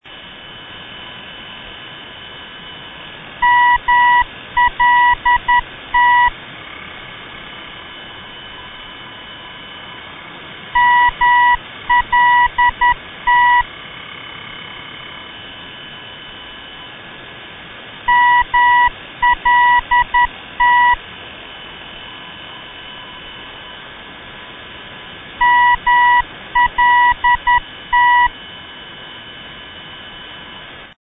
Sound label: ndb malta